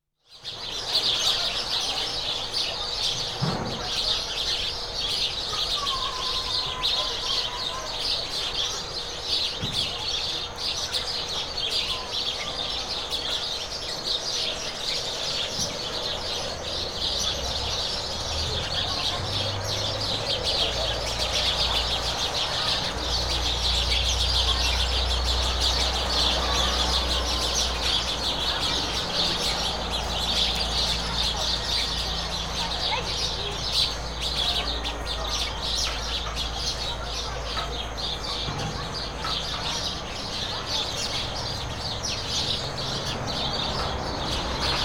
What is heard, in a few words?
Small Boats Being Loaded In Port, Rural South America; The BBC Sound Effects Library